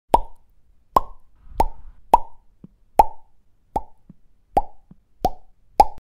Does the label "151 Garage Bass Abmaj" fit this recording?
No